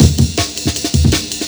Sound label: Sonic Amen; Jungle; Breaks; Blu Mar Ten - Jungle Jungle - 1989 to 1999 Samplepack